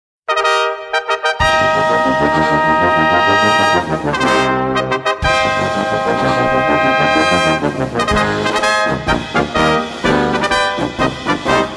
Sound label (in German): Blas Musik